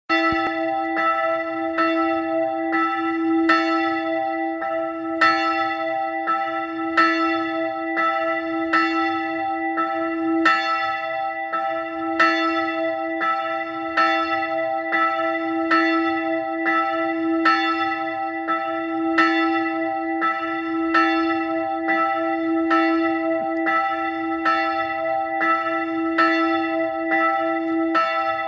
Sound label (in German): Glockenlaeuten neu; Es enthält das Läuten der neuen Glocke; Bethlehem-Kirche; es ist wieder Gottesdienst (oder ein Konzert) in der Bethlehem-Kirche